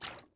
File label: fs water, footsteps